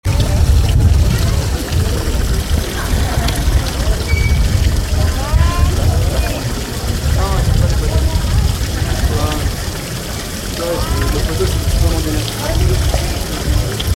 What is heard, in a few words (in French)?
fontaine